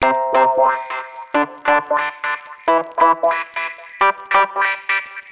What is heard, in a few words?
Bitter Piano